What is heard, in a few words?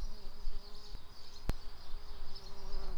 bumblebees, Hypnorum workers